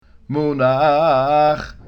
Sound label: munach, cantilation